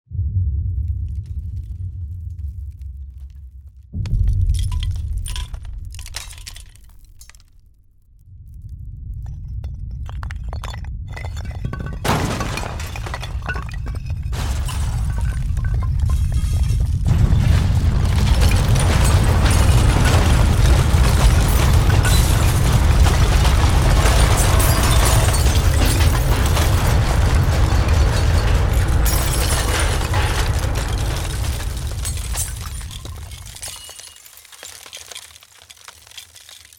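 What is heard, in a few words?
two small tremors and then everything collapses glass flies; Звук землетрясения в доме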